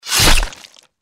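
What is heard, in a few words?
deathclaw claw attack; npc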